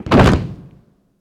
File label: Soldiers